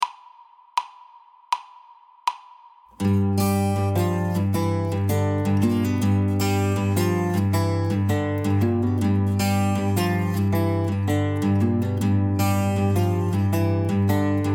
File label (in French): Guitare basse voix; CHANSON